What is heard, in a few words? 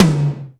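GOGO tom, GO GO DRUMS; Crypton - DrumBase5000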